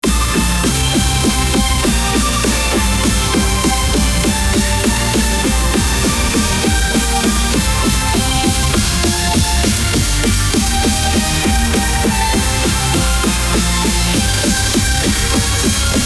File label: dj music